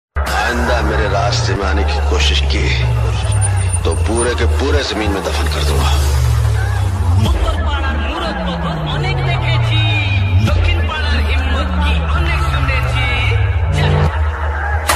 power music bass pop bass full setup dj box competition